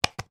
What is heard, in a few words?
baby toy wooden puzzle piece place in board